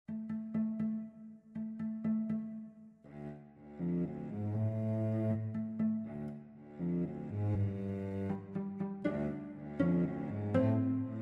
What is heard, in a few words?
Cello; Tonart: d-Moll